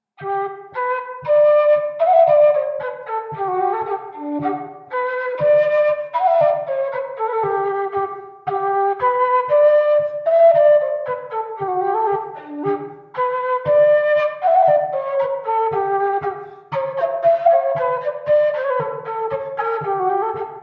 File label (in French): Bourree; Recueil De Raoulx